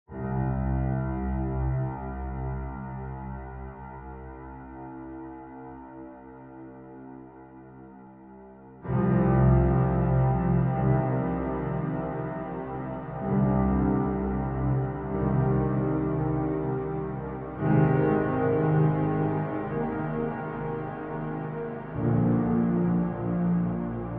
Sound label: piano wet